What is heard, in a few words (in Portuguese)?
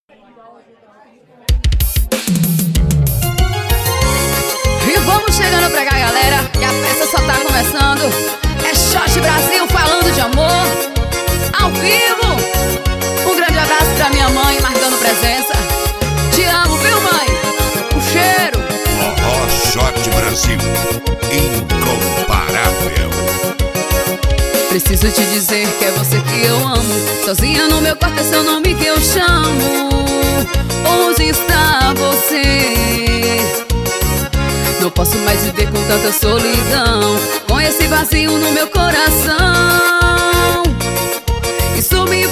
forro xote; forro xote brasil